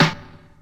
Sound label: 2000s small reverb hip hop snare one shot d sharp key